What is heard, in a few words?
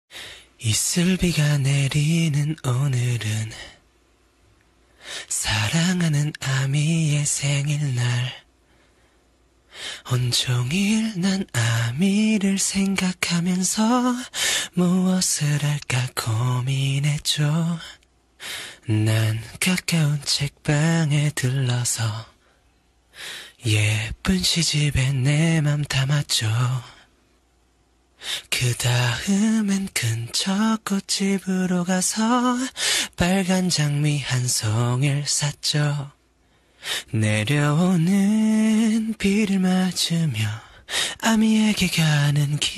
Birthday Song